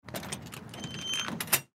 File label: Hangar Door Open, Hangar Door Open Small; Transportation Aircraft; Airports